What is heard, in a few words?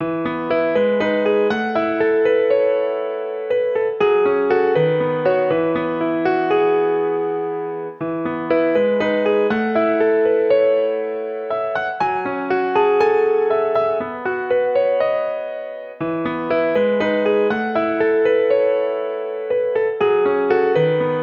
(piano ver.); primal hearts2 character song sound album 2015